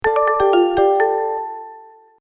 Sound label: Novice, notifications; Ziox Zi4004